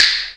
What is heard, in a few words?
Rhythm; kids park kaitou sentai lupinranger vs keisatsu sentai patoranger